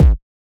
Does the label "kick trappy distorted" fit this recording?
Yes